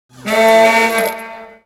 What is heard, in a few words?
Horn; Cars